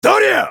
攻撃; ナル

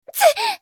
被ダメージ; ナタリー

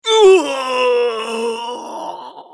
死亡; クラウドボネ

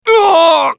男 ダウン; オーシュマスケッティア

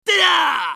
攻撃; コインブラトゥルーパー

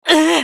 ファイター男 被ダメージ

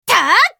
攻撃; ダリア

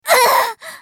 死亡; ソソ

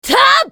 攻撃; セルバ

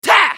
攻撃短; パンファルロ

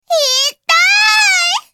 ダウン; ルディン

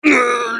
被ダメージ; クラウドボネ

